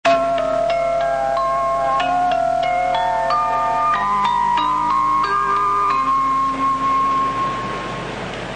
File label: chime, train; 東海道・山陽新幹線; のぞみ（始終着用）; ２００３年１１月２３日まで使用されていたチャイムです。